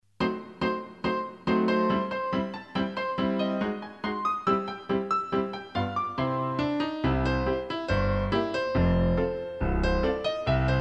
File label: The entertainer with Melody